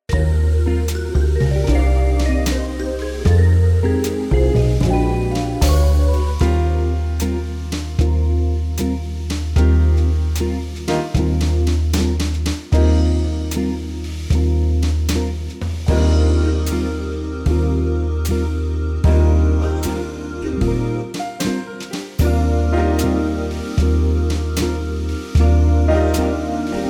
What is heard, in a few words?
us ballad